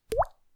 Water Drop

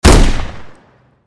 Shotgun Shot Blast Outdoors Close

